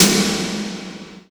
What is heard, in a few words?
CYBER KIT, DRUMS